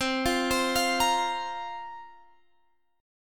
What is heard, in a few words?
C 7th Suspended 4th, Guitar, Drop D